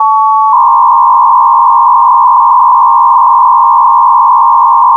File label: BPSK250